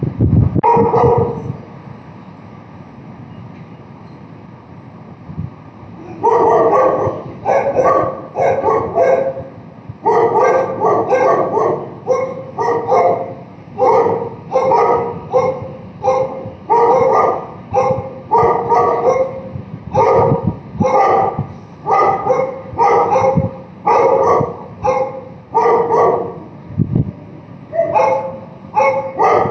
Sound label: bark samples